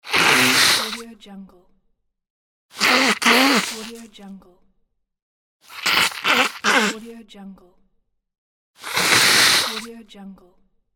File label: nose blowing; خالی کردن بینی; human sounds